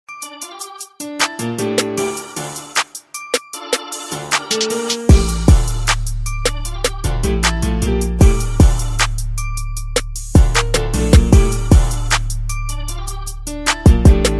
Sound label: Instrumental